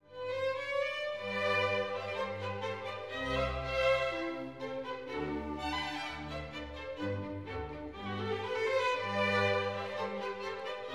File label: outer voices oboe concerto; Haydn